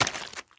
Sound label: meat, player steps; quake2